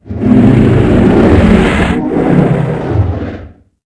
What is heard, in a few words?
voice die; balock